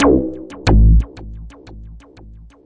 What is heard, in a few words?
090 echoey two hit bass